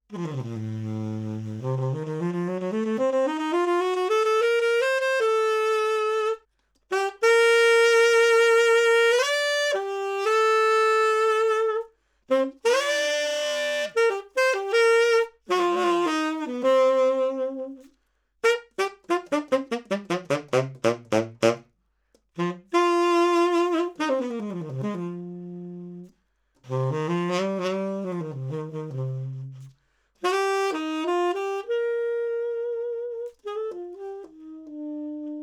Sax Tenor 20cm High

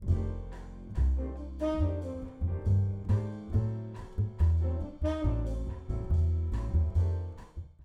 Rough Mix Bass

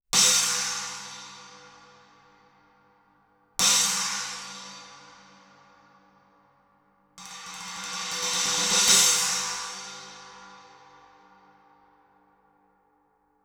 Cymb China Close Edge

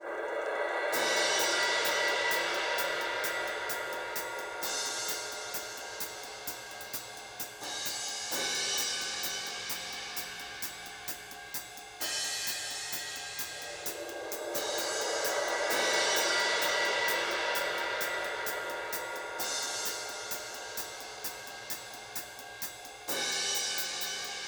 Cym Solo

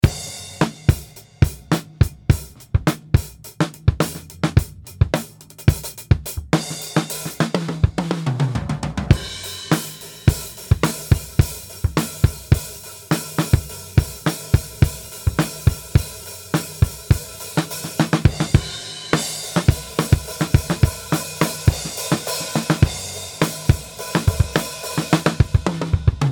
Dual Array Facets Drums Mix With Spots

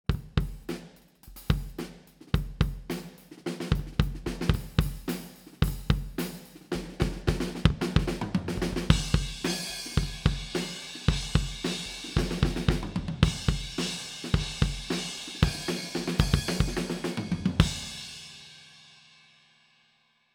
Kick Head Off; U47 FET Outside 90cm

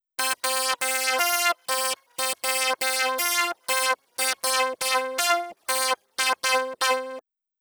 Synth Wide Autopan

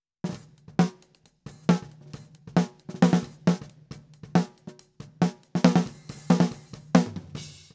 Snare Distance 3 cm; Recording Mistakes

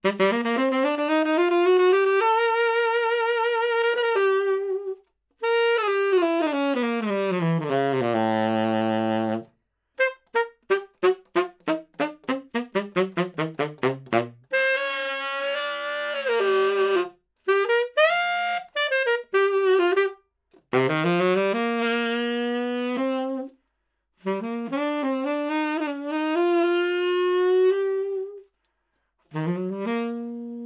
Sax Tenor 50cm L Mid

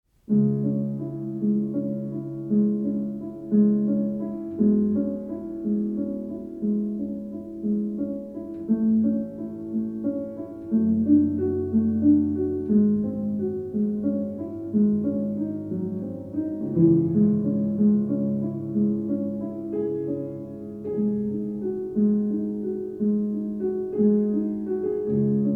Resonators Piano Cardboard Box Stereo

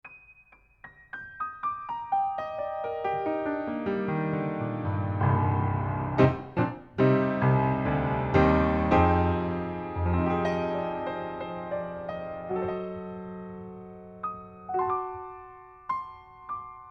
Piano, Front To Back Mic

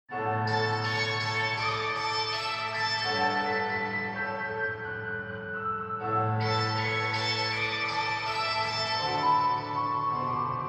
Mix Reverb Sustain

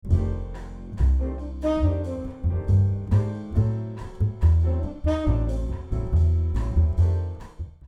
Bass Mic DI Raw

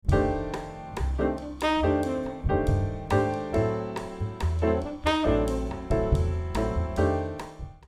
Sax Meld

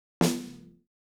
Snare Comp Fast Att Fast Rel

